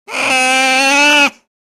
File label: Otter Sea Call Whine